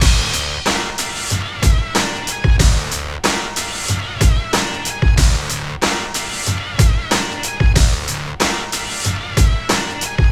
093 Hard HipHop Combo D min; Digidesign; Pro Tools Essential Loops

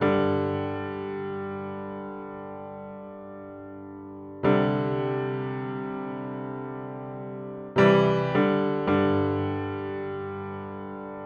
Country Piano Verse 1; Digidesign; Pro Tools; Pro Tools Essential Demos; Essential Loop Demos; Demo Session - Country

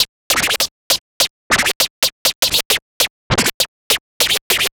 Vinyl Scratch Snare

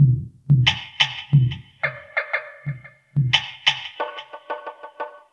090 Reggae Percussion; Digidesign; Pro Tools; Pro Tools Essential Demos; Essential Loop Demos; Demo Session - World

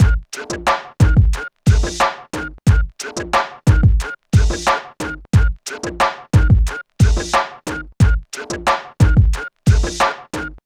HipHop Combo F min; Digidesign; Pro Tools Essential Loops

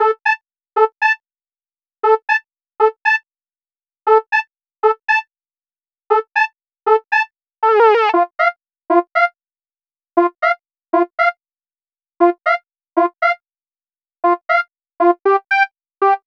South HipHop Synth Lick Amin; Digidesign; Pro Tools Essential Loops

